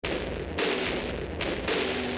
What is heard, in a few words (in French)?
batterie